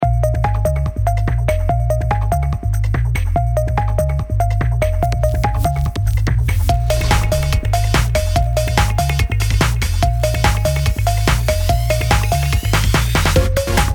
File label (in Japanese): テクノ